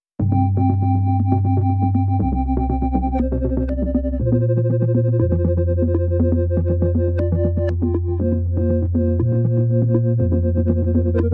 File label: Bumper